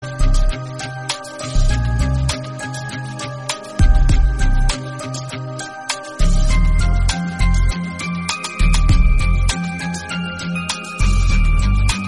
Relaxing Tone

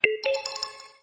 Samsung Galaxy A25 SMS

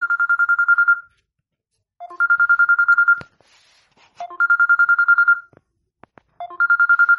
Samsung J2 Basic Bell

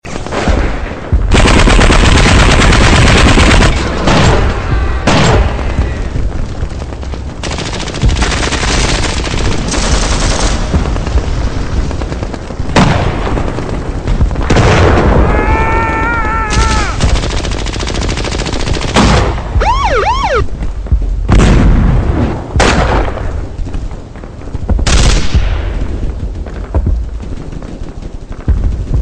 War Sounds, sonnerie

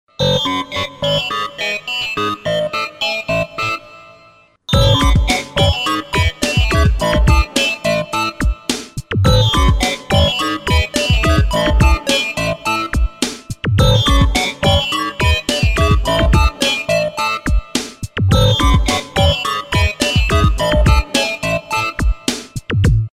Nokia Robotic

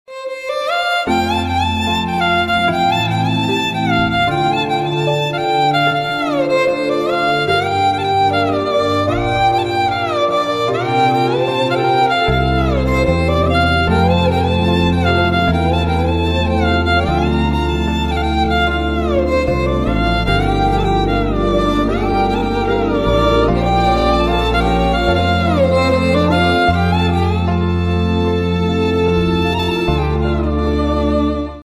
Samsung Violin

